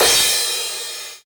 ride one shot B key

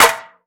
noisy acoustic snare sound a sharp key

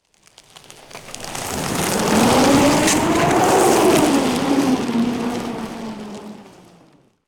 snow samples stepping and crushing

